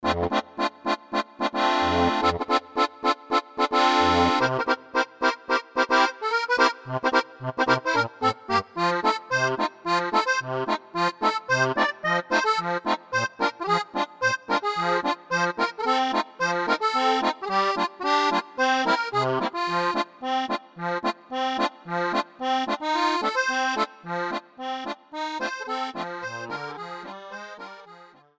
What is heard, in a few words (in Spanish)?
sample acordeon, rock